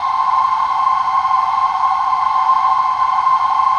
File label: CRIK CHOIR; Distored Reality